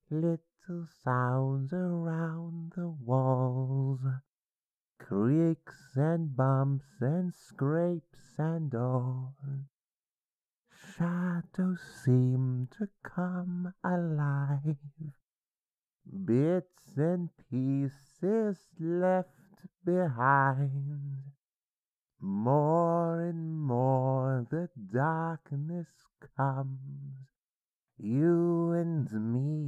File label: Creepy Song